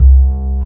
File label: Double Up C, Basses; Total Drum&Bass